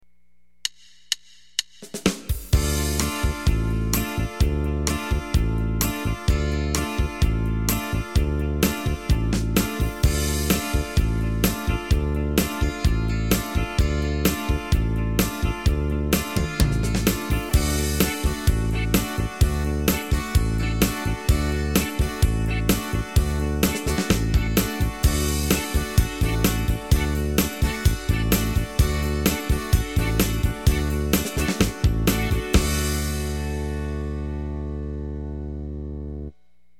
bebop; yamaha

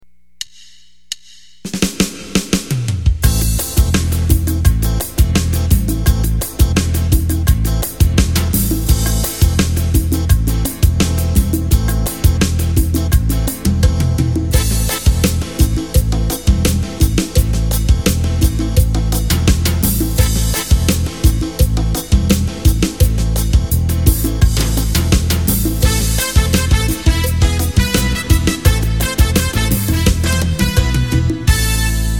mambo; yamaha

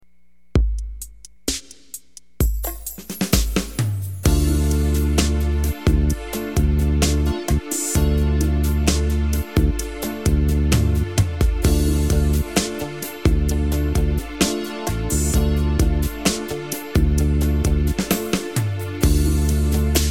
slow techno; yamaha

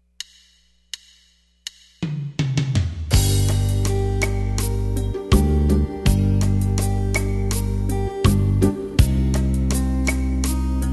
Ballad; yamaha

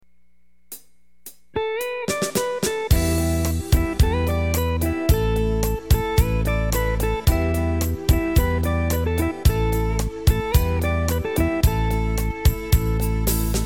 bebop; yamaha

